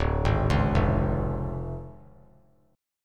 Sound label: D Minor Major 7th Flat 5th, D; 2,3,4,3; DmM7b5 arpeggiated; JGuitar